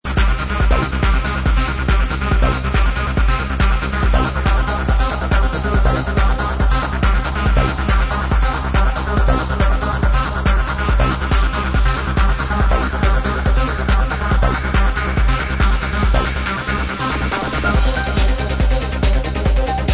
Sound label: hold groovy